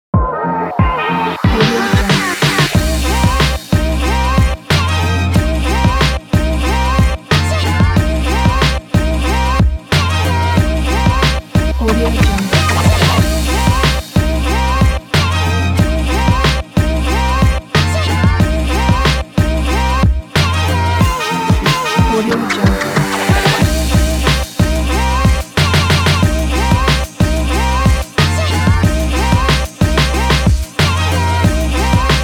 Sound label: hiphop; waveclubsound